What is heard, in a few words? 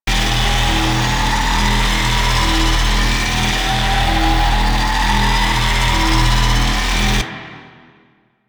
Modular Primary Barrel Laser Loop; Warframe; SU Modular Guns